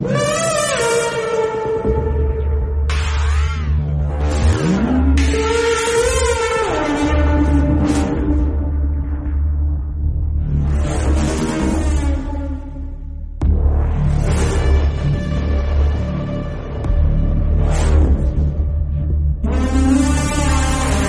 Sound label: background music